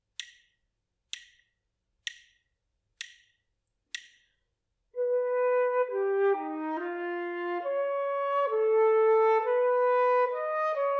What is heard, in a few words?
melodic dictation; ear training